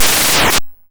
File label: YM Noise; NOISES; ChipShop Deluxe; ChipShop Hits